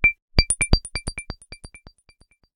notification; Oppo; F3 Plus

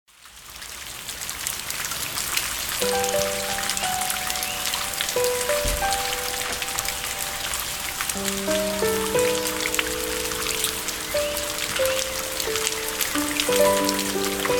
Rain

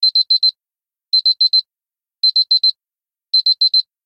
Alarm Beep; Optimus Hub E510; alarms; LG

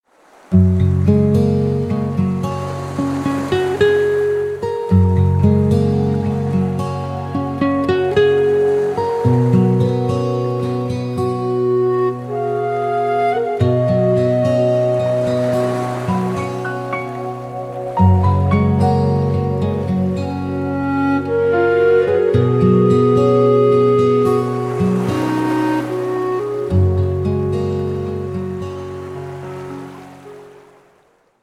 Vibe; Itel; Power-80

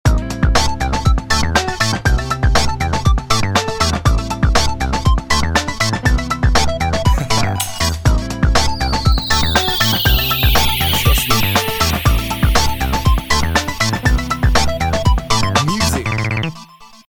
Melody, Ring; Doro Liberto 820 Mini

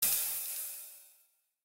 Jazz leave application grid

